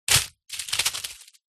spider land in foliage dirt leaves then scuttle away; animals insect